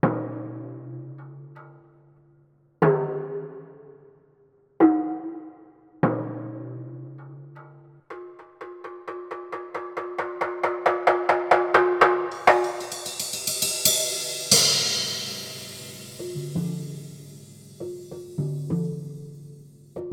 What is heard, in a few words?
Rototom